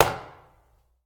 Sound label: gunshot; Factorio; Factorio 0.14.21; fight